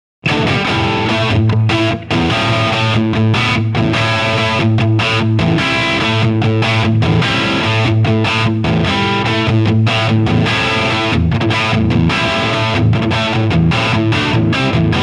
Bridge PU 77 Marshall 2203 Marshall 412 300 Watt Shure SM57